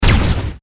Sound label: UI shot; Paradise